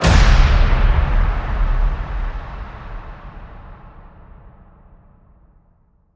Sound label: Hit Big Clutch